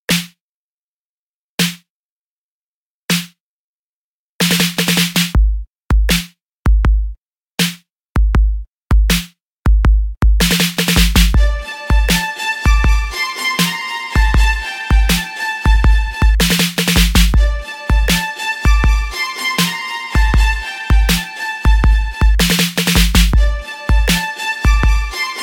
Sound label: Viola